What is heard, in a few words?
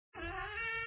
enchanted door; Quicksilver